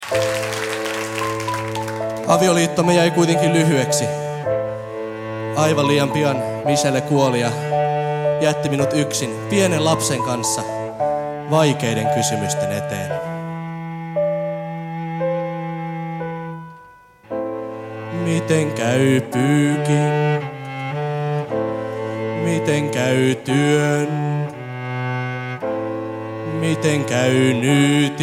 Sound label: baby blues; Teekkarispeksi2011; Cirque